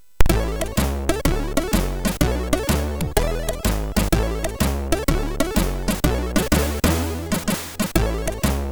JAZZ MAD, MOS6581; MOS6581R3; hvsc

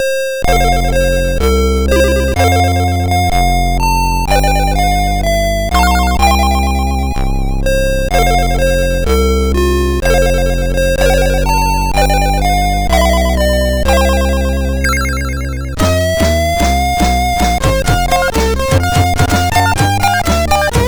National Anthem, sid MOS6581R2

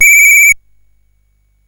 Paddle Mania, CSG8580R5

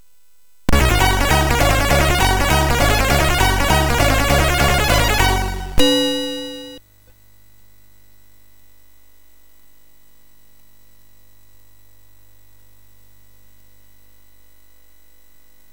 Music Demo Version, MOS6581R3; hvsc

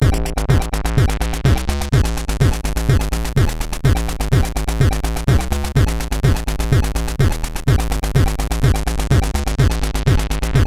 Acid Power, MOS6581R3

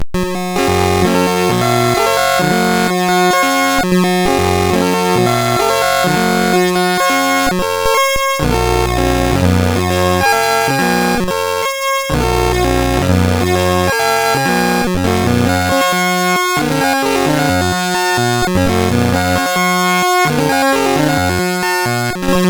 Mini Hoedown, MOS6581R3

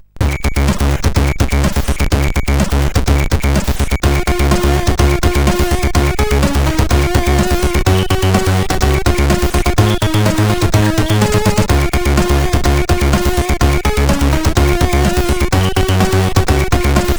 Christmas tune, sid, CSG8580R5

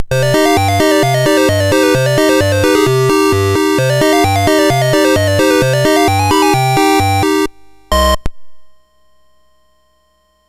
Dragonworld sid MOS6581R4

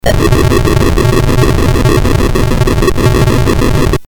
sid 8580; Bowling BASIC

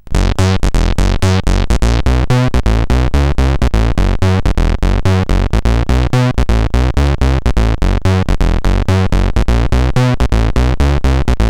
Simple Bassline, CSG8580R5

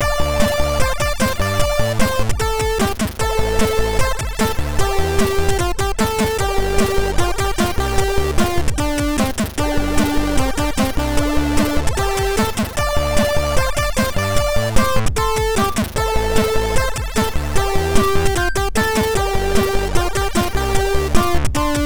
Cracking Intro Tune, sid MOS6581R3

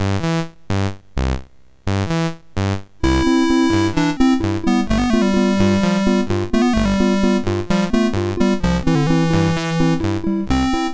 Tango, MOS6581R2

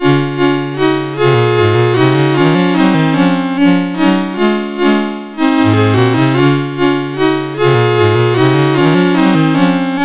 Chorale, sid CSG8580R5

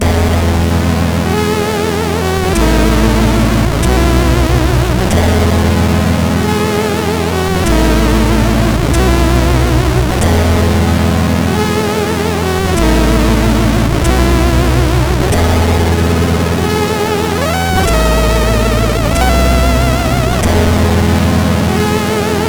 Short, CSG8580R5; hvsc; Luca

